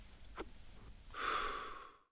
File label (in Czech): koureni; Mafie 1